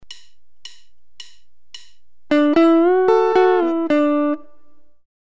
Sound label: Intricate bending lick, guitar